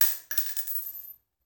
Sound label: Bullet Shell; Sound Ideas; Guns